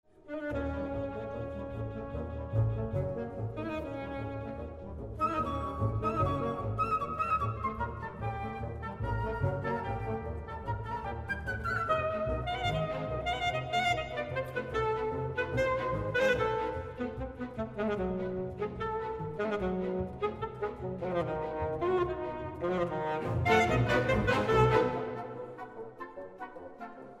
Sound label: Sax Con